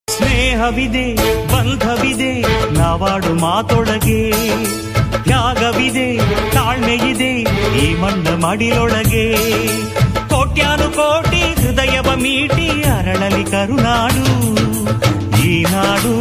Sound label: kannada movie ringtone; kannada black screen movie ringtone; kannada rajyotsava bgm